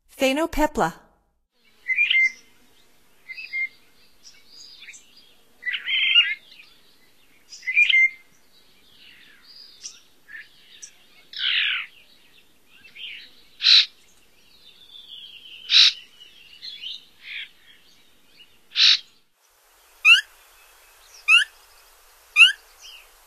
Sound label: Phainopepla; North America; Stokes